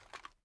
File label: ar inspect, weapons; viper